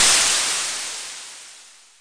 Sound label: Simmons SD-5 drum machine